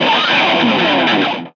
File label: Music stings Guitar slide; game rip; Lego Marvel Super Heroes